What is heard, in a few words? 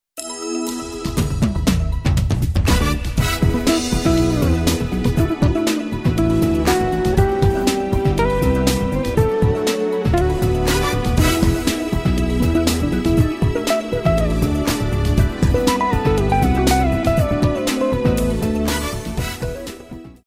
Modern Jazz